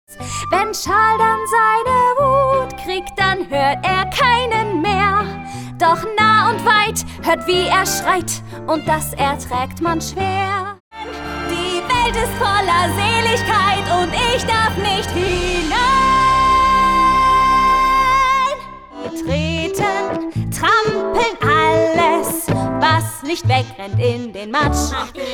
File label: Very Short Singing